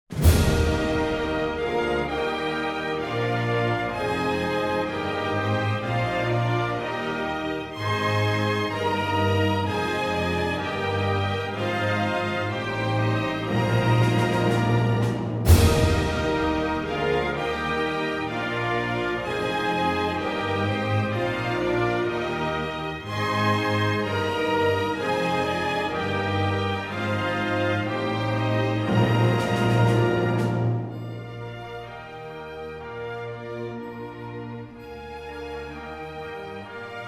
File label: German National Anthem, Symphony Orchestr; Das Leid Der Deutchen; The Slovak Symphony Orchestra